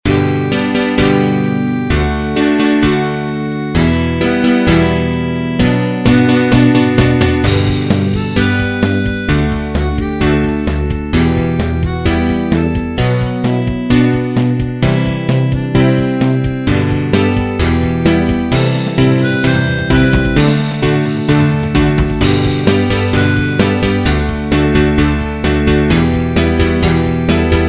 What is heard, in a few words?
Flexibone harmonica